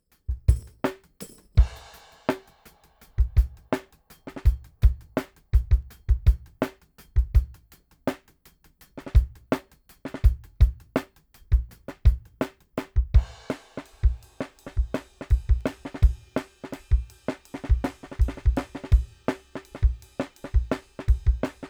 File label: whole mix